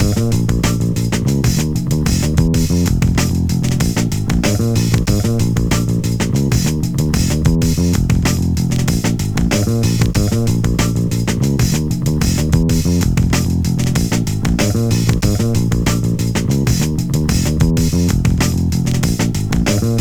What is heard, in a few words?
Load Loop; NBA Street; GC; PS2